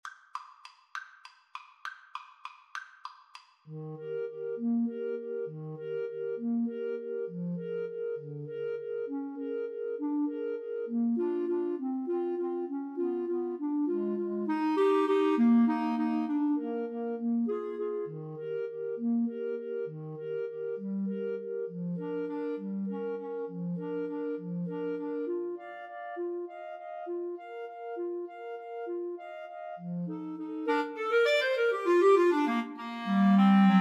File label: Clarinet Trio, waltz